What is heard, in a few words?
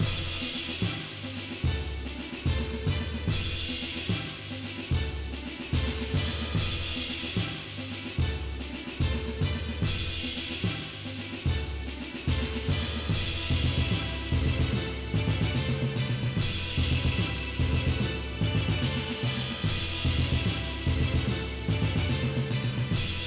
Music Menu; The Mysterious Past of Gregory Phoenix